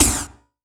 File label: C2, synth line; 90 s Sample CDs; NiceBeats; SynthLine VOL-3